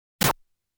Large Enemy Shooting Sound; Zero Wing

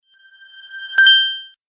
SFX Ding Search

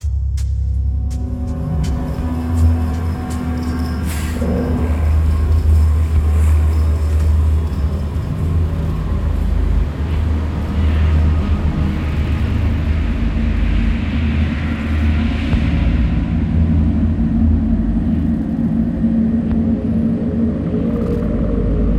Normal Theater Ambience

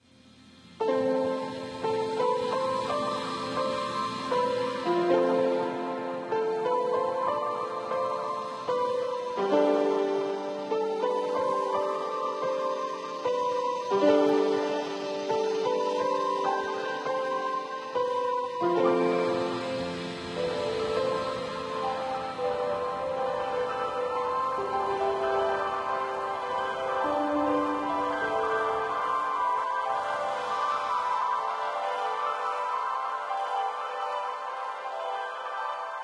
Harbour Sting; ether one redux gamerip 2015

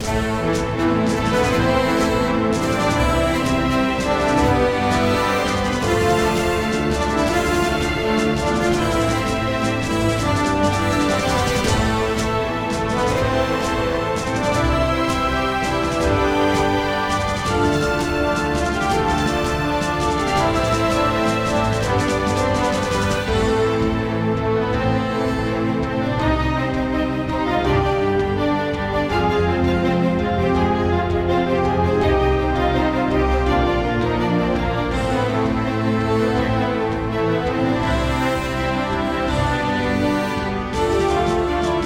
Alert; Vent

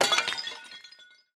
Break Vase; Boomerang Fu

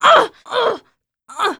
female choke

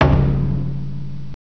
Credit SFX

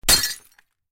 vase break; Octodad Dadliest Catch

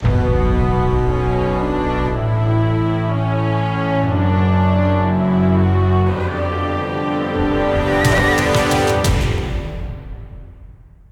Course Intro Fanfare; crash bandicoot series sound box; Tiki Turbo

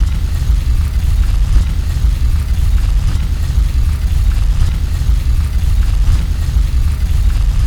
Roll Heavy Stone Rumble Loop

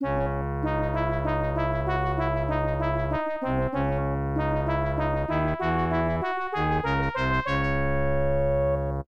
Defeat Fanfare; Nobunaga no Yabou Haouden; FM Town

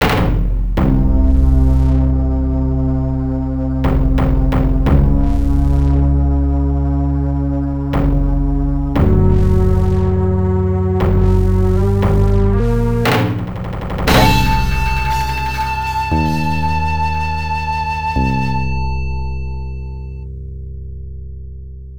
The Peerless Sword; Samurai Shodown; Samurai Spirits 3; Blades of Blood; Neo Geo; arcade; Interim Demo

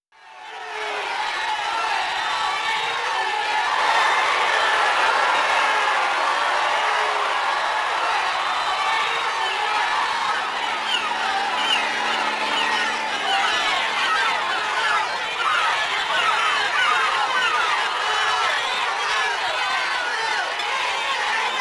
France Stage (AMBIENT); King of Fighters XIII; PS3 version

